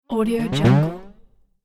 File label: twang effect